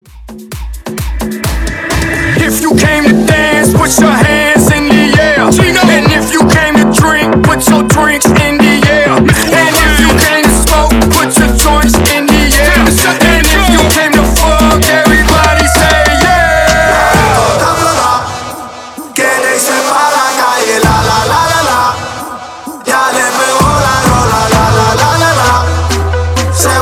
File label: Tribal 130 BPM